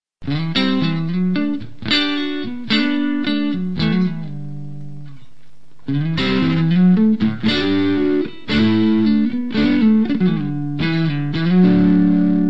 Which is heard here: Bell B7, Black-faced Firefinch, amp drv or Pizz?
amp drv